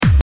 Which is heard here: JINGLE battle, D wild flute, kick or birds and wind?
kick